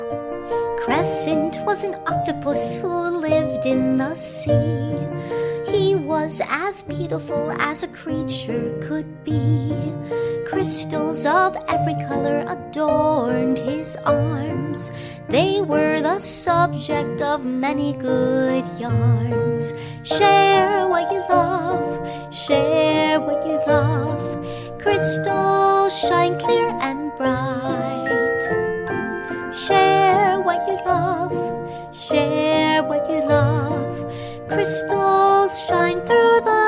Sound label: Children singing